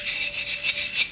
Shaker; neuro; InsideInfo; Real World Sounds

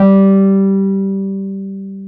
PNO, Wurly

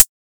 Chh, Drum Hits

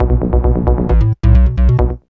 B-LOOP; X-Static Goldmine 1

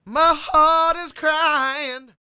SING, Vocal Planet Blues Soul BLUESY; Spectrasonics

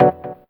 ORGAN A

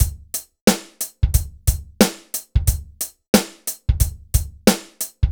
dry Loop BPM 90 Dry, AKAI S6000

